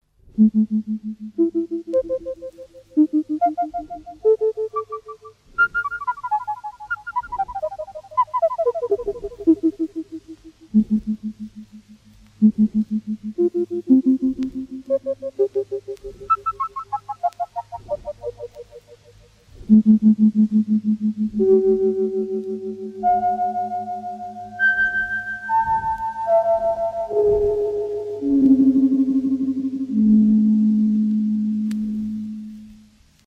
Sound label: single sounds in canon; His Master's Voice